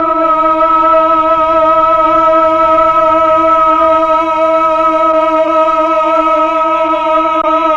MELLOTRON vox E3; Big Fish Audio - First Call Vintage Keyboards

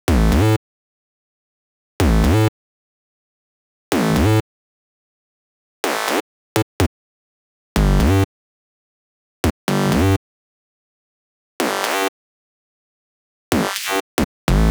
Bass, Afterhours EDM, Electro Shock; Sonic Foundry (Sony Creative Software)

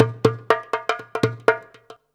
119 DJEMBE, Modern Percussion Loops

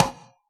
latin kit conga

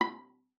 B5, VIOLA PIZZ; Xsample VOL-5; 90 s Sample CDs

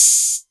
808 Hat O; Novation Drumstation

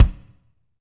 KIK, Drumscapes Roland

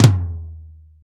TOM; Northstar - Drumscapes Roland

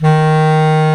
BS CLAR, Clarinets; Roland L-CDX-03 Disk 1; Lo Clarinets

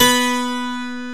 HARPSICORD

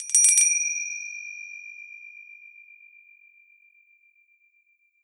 bells, bowls

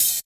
Open Hhat, HiHat; Equipped Music; Loungin House

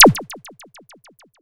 Breakdown FX, Underground UK House & Electro

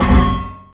METAL; Optical Media International; Sonic Images Library; SI FX Vol 13; Instrums

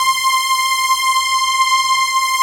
VS strings; Greytsounds; Scott Peers Sound Engineering

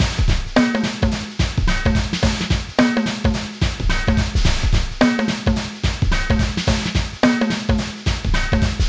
PHATT BEA

